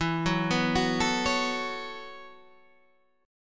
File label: B 7th Suspended 2nd Suspended 4th, chord